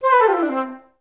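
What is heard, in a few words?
flute run down C3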